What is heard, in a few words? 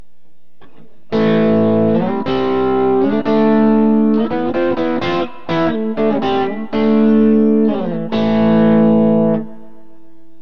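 phat cat bridge, guitar pickup; burny; fugly clips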